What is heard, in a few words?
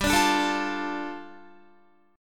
G 7th Suspended 2nd, Guitar; G7sus2 strummed